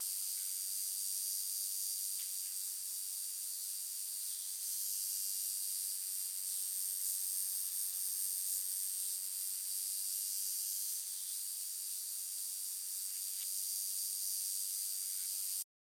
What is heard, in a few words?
Tattoo Machine